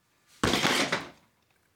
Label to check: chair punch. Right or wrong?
Right